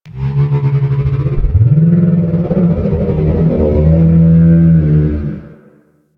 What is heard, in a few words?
Mushroom Adult; ambience; props; one offs; Warframe; Venus Open World; Venus Landscape